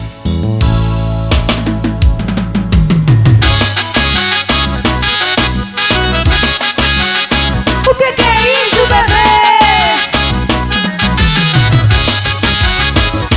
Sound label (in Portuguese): forro gravado ao vivo; vila punau